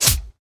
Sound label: white spikes appear; Hollow Knight